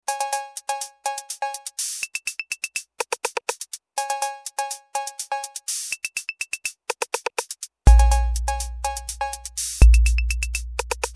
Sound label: MFB Tanzbär Lite Electro